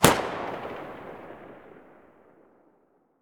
usp45 muzzle mono far, weapons

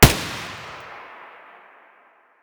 sig550 muzzle distant; weapons

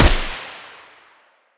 muzzle g36 mono distant

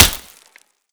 dirt, bullet collide; STALKER; IWP

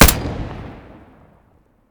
muzzle glock17 stereo

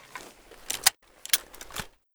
rgm40 draw

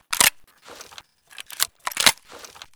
mossberg reload empty, weapons

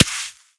sand, bullet collide; STALKER; IWP

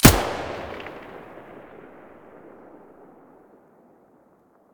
vihr muzzle stereo, weapons

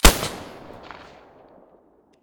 mosin silencer stereo, weapons

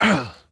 hurt; STALKER; IWP

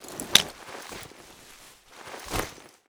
medkit use; STALKER; IWP; item usage

